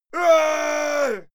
male fight scream; schreie; menschen; soundpool

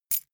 key ring mid size catch; objekte; schluessel

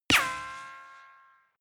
gun shot ricochet drop

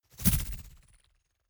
fly by insect drone fast

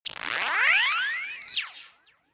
crackle scanner short; controller; soundpool

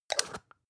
spice jar scratch close; industrie wirtschaft; gastronomie

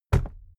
briefcase plastic put down hard; industrie wirtschaft; bueroarbeit

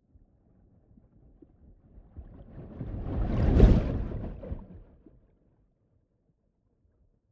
whoosh abstract slow

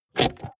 car bmw e46 interior handbrake pull up fast

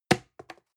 plastic can small fall bounce hard; objekte; dose

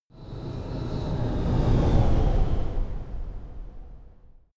sci-fi vehicle train passing; raumschiff

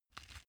small pepper grinder soft quick

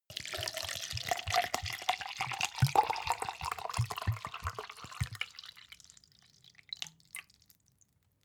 cup plastic pour bottle; industrie wirtschaft; gastronomie

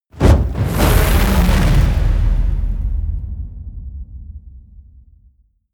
spell fire cast large; explosionen; comedy-cartoon; soundpool

